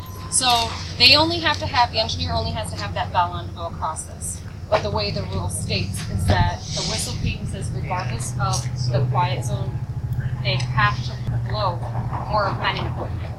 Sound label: train horn